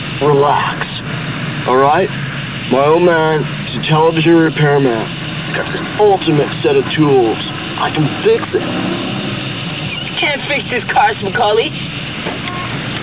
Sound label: crashed car